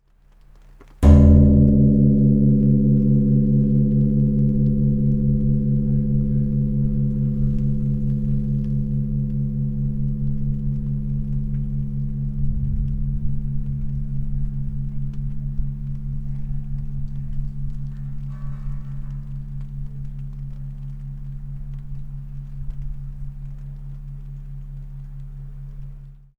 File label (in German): Klangstein